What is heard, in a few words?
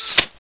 scan deactivate; cso; x-12